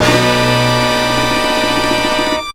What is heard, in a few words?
BRASS LONG; JUST FOR FX